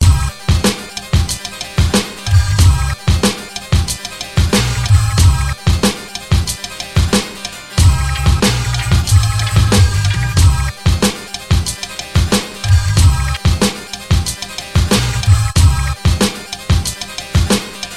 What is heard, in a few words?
beat